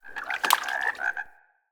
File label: Wetland Forest Day Frog; Midrath Island; Ambience